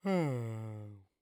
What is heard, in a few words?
Human Male Yawn